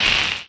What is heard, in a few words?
Ranged Magical Light; Kilta